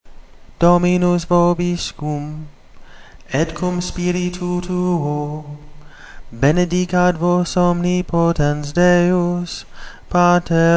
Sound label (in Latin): Dimissio Tonus simplex, chant